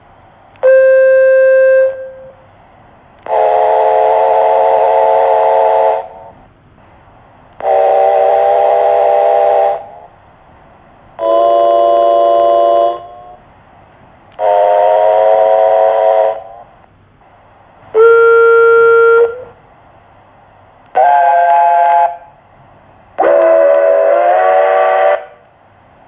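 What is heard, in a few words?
australasian whistles, locomotive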